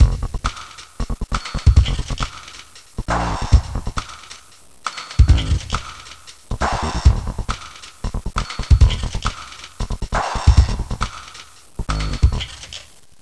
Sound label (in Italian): suonerie musica rock